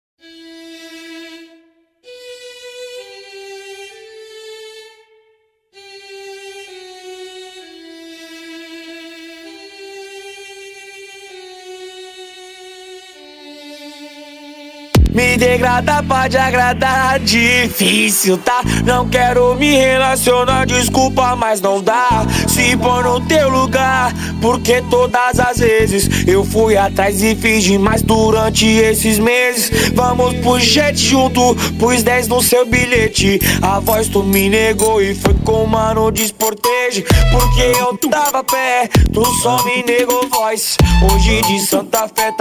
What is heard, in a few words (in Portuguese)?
explode funk